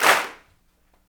CLAPS, Africa Handclaps

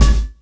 CUT, Drum & Bass; Ueberschall; Drum & Bass Resonance

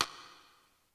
Sidestick; Giga Samples Collection; ELQ-DrumQit

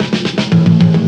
Roll Away, Drum Rolls; Jungle

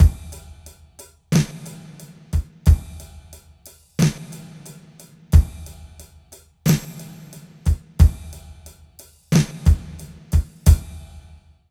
124 LOOP; East West - Phat & Phunky CD2; 90's Sample CDs

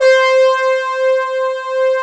C5, Synth; FRENCHY

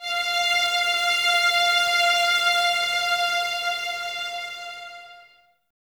Violins F 5, String Ensembles; Light; Miroslav Vitous; 11 Violins

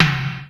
Misc Tom; Mo' Phatt; Digital Sound Factory Mo' Phatt

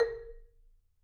MMEDD A#, Marimba; Sampleheads - Dave Samuels Marimba & Vibes

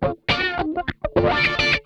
RAW, Funk Guitar; 90 s Sample CDs; Zero G

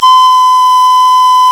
PANFL, Pan-Flute; Sound & Vision - Gigapack I CD 2 (Roland); Brass&Woods2

